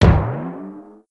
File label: umbrella; Cartoon Game Sound 2.0